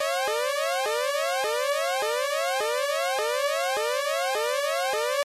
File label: up down mix; emu workshop